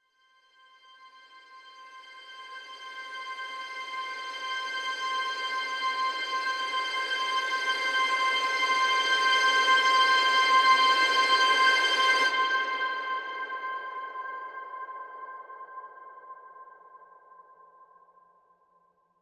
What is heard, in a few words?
Gentle Harmonics C